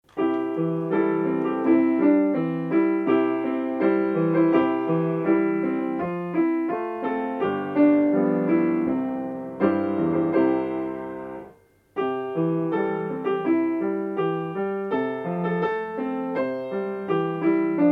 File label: piano